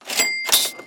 item buy

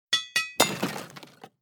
hideout collect stone

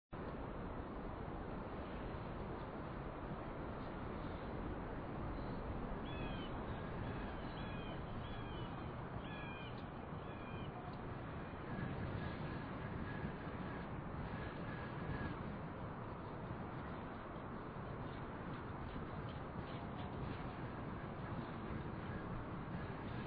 ambience dungeon

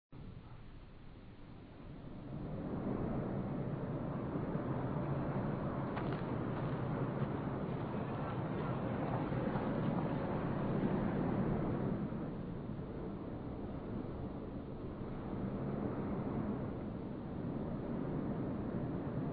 ambience location

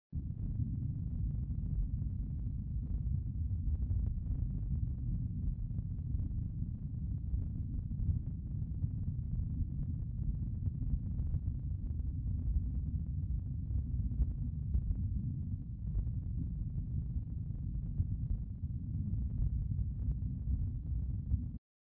ambience duel

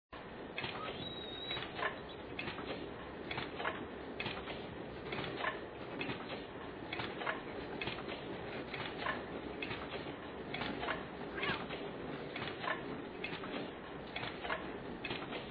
ambience dungeon

